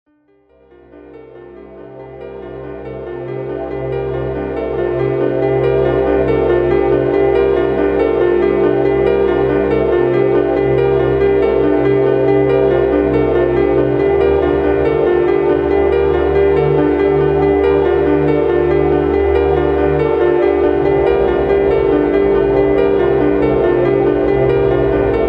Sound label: ambient